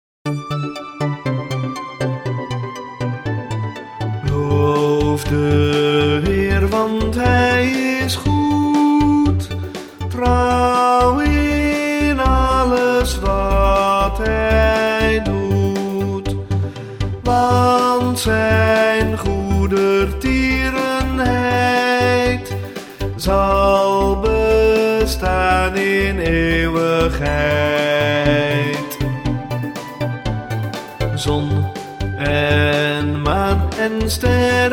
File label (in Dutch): Ps 136 zang